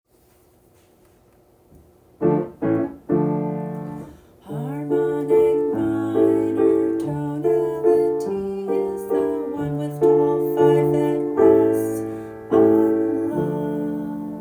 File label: harmonic, minor tonality